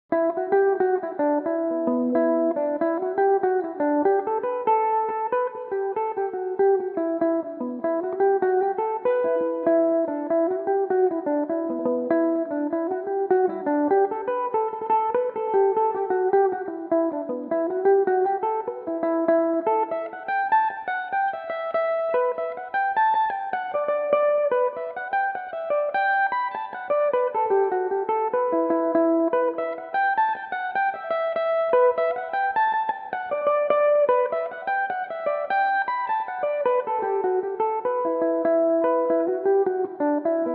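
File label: E dorian, Irish mandolin; Gerri's Favorite; Belmuse EM-190 electric mandolin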